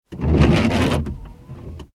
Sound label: transportation car wipers rubbing single; Car Windshield Wipers Single Swipe With Rubbing; Cars; Vehicles; McKinney Sound